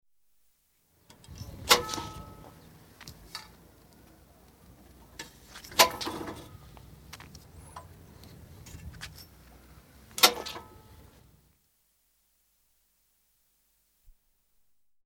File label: Cessna C150, exterior, propeller turned by hand (Light aircraft, single piston engine); Airplane Sound Effects; BBC